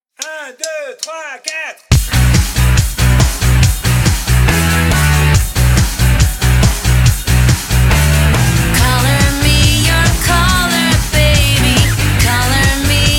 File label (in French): rock chanté